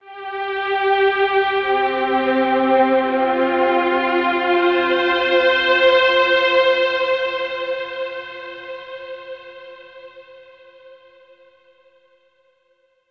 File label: Overlay Tone